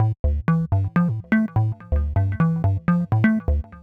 quaint, Electronic Loops

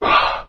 "ah"

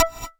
grind, Misc Percussion

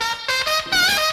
squeaky wheel

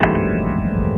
dissonant

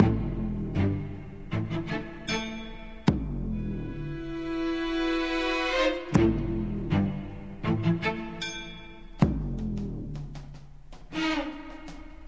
strings; Sad

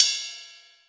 Hats Real Open

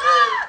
"ah", gasp; ooh ahh

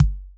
glubbin, Kicks Soft

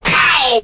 ow

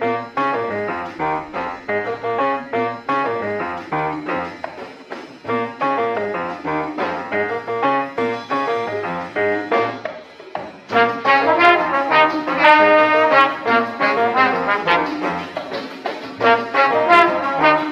piano horns salsa, Phonky loops

